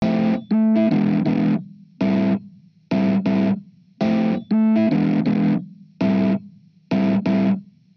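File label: amp epiano, Hybrid neural guitar overdrive; honeybee; Morphdrive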